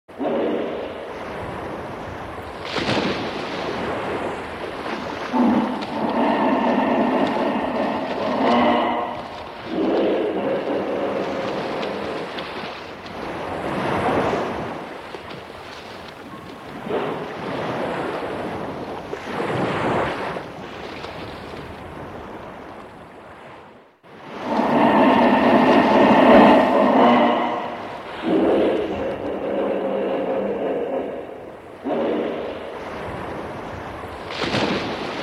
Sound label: hippopotamus